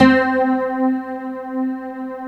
PULS HARP C3; Big Fish Audio; Synth City

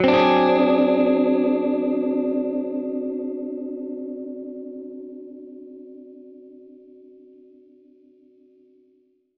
Gretsch Chord D7b5, Guitar Chords

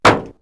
Tick, perc fx; X-Static Goldmine

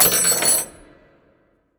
Prepared Piano; Battery 3 Sample Kits

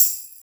POP TAMB, TAMBOURIN

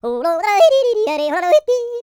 YODEL; ILIO; Vocal Planet

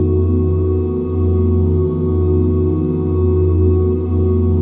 OOH F FLAT5, OOH CHORDS, Vocal, Jazz; ILIO; Vocal Planet; Jazz & FX

